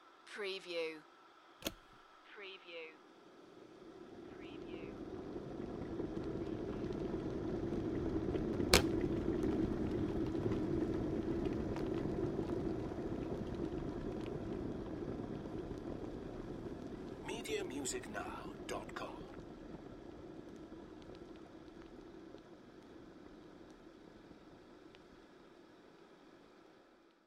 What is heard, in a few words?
Kettle Re-Boil; beanstalk audio